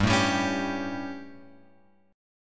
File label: G Suspended 2nd Flat 5th G, chord; Gsus2b5 Chord {3 0 X 0 2 3} strummed